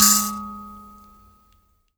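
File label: HI KALIMBA G#3; Heart of Africa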